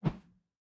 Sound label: Wooden Stick Swing